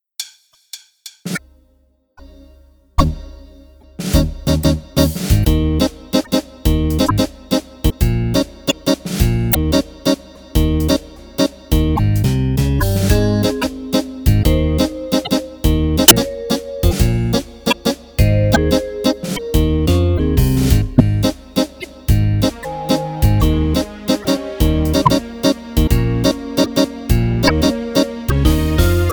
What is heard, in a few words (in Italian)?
Medley Valzer Base